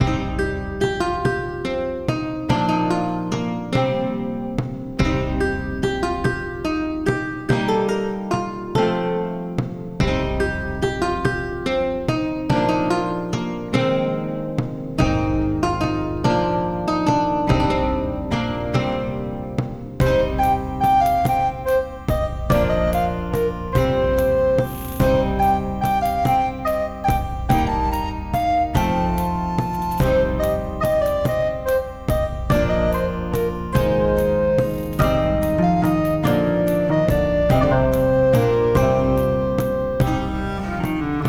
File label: Medieval Tavern Song